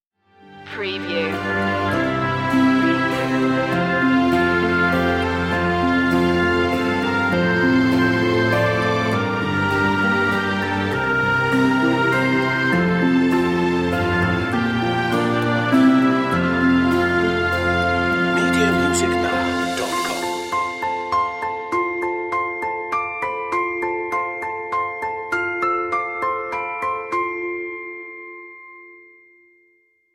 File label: orchestral; away in a manger; media music now